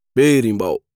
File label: Berimbau